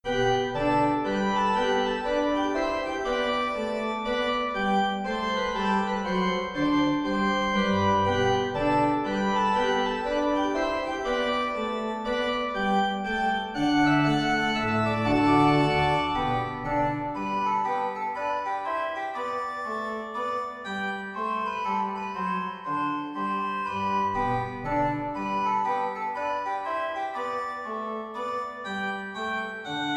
Organ Miniature Gavotte in G Major

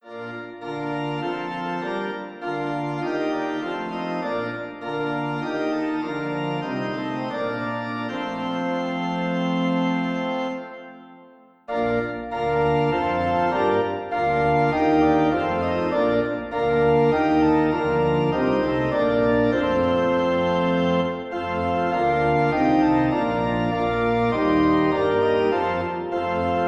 Organ

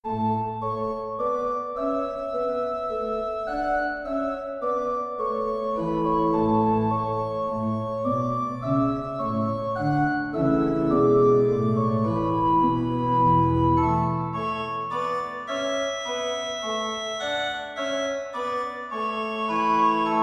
Organ Minuet in A Major

